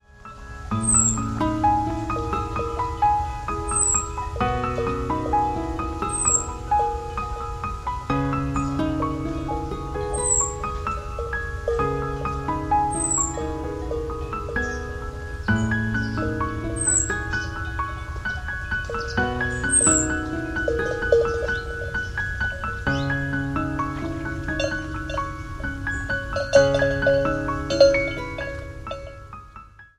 Blue shadow flute; MIUI14; HyperOS 1.0

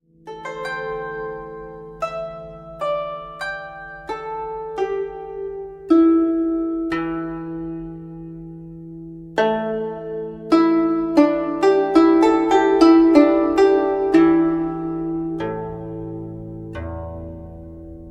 Meditation; MIUI12

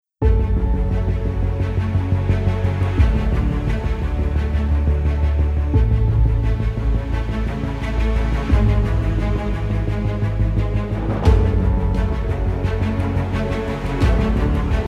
Epic Dramatic; MIUI12

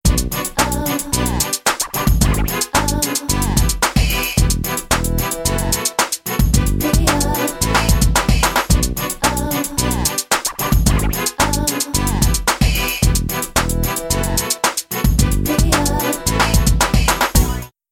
Fun Pop (logo), ringtone; MIUI12

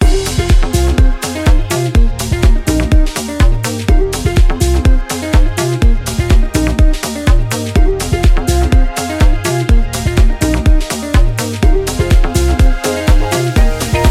Upbeat Funky Pop Loop; MIUI12

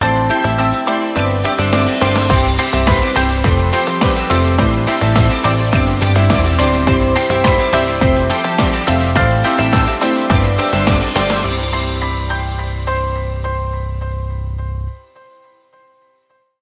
Smooth Uplifting Summer; MIUI12

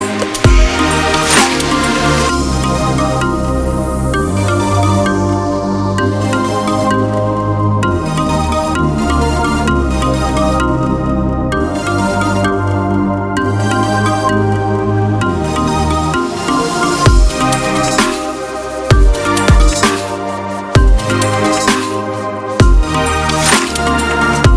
Trendy Electronics; MIUI12

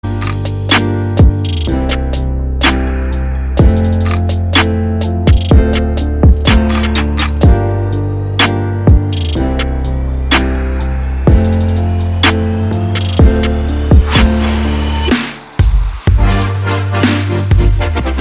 Instrumental, ringtone

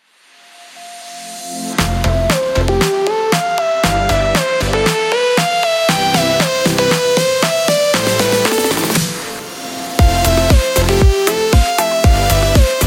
Uplifting Dance Pop, ringtone; MIUI12

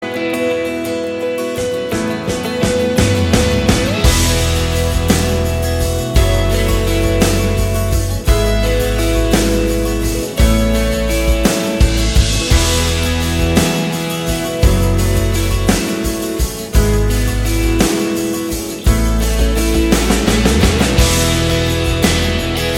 Uplifting Inspiring

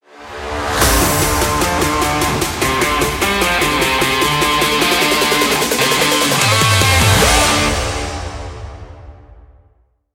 Trap Rock; Trap Rock (Stinger); MIUI12

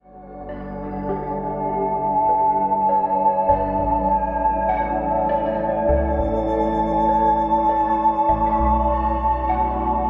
Incomplete rhythm; MIUI12

